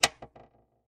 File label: down